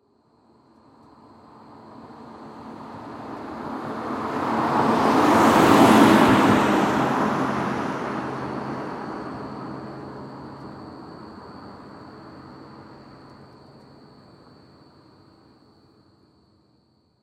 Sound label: Auto Aston Martin Pass By Slow Right To Left Automobile Car; Vehicle